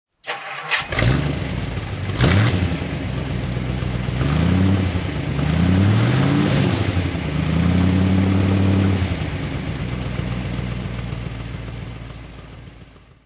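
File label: facel fv3b throttle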